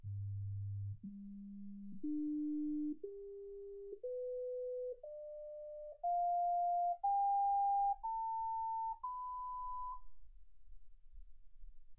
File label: sinus tones 48kHz